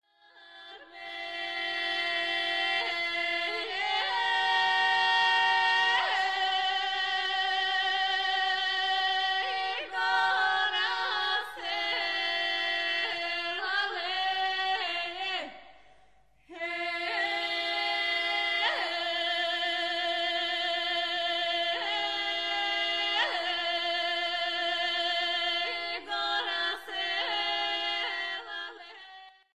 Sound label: Bulgaria